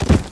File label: bhit kevlar, player; cstrike